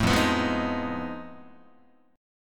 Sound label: F 7th Sharp 9th Flat 5th F Guitar; F7#9b5 strummed; 0,1,2,3,0,2